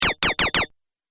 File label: Quad Laser Shots; Laser Gun Sound Effects; Sci-Fi Laser Sound Effect